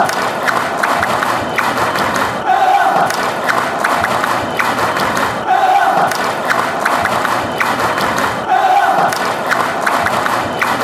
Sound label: villa clap